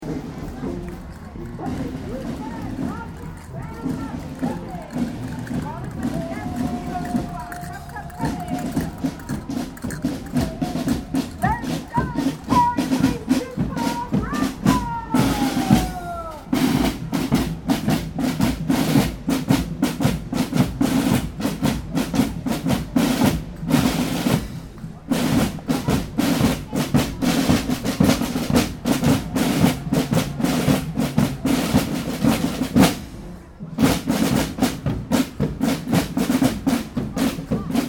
Ambience Melbourne Moomba Parade Police Junior Drum Group Stereo, Parade; Moomba festival Melbourne 2009; Parades